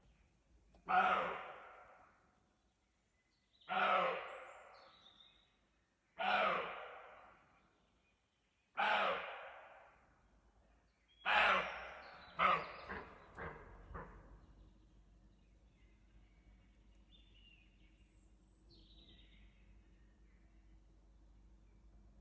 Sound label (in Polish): odgłos kozła; kto szczeka w lesie czyli leśna opera saren